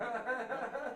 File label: hahaha